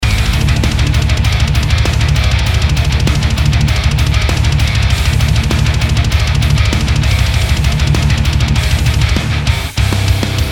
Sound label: MIX SINAMP